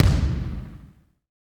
kick, ambient kick; Rhythm Section

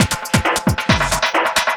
LOOP, Drum & Bass, 135 BPM; Ueberschall; Drum & Bass Flash Trackz

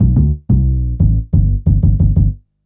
Upright Synth Bass, Drum & Bass; Fluid Dynamics

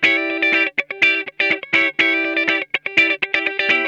E PEDAL B, Funk Guitar; 90 s Sample CDs; Zero G

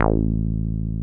303 A2 mp; Drums In Your FACE!

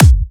Electro Kicks

